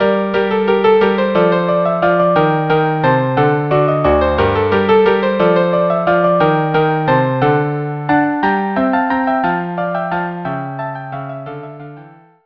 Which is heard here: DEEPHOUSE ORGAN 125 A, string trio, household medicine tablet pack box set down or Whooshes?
string trio